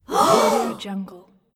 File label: a crowd gasp